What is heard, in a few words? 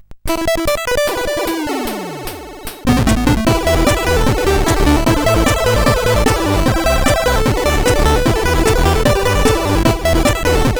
Keygen, sid, CSG8580R5; Elimination